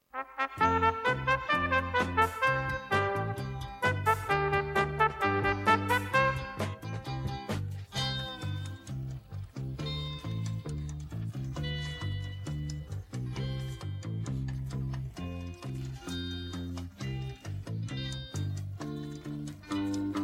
detskii khor druzhba minus; pesenka

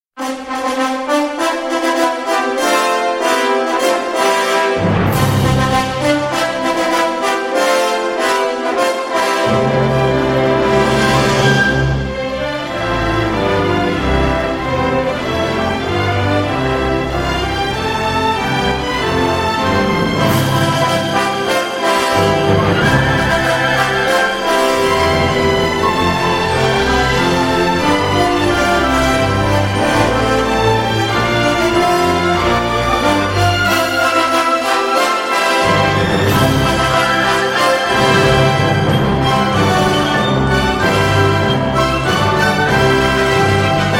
fanfary; olimpiiskiye igry; det skaya olimpiada zvukovoye oformleniye

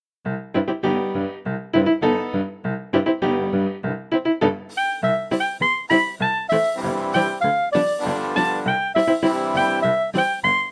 igra s bubnom minus

